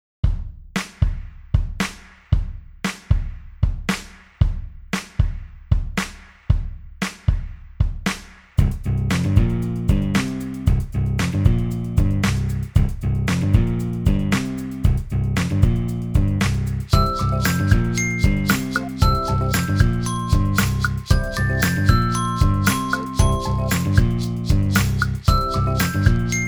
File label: CHIME BARS with ACCOMPANIMENT